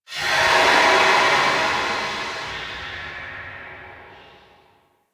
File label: Town Steam Release, Ambience; Warframe; Duviri